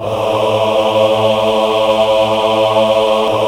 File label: AAH, VOCAL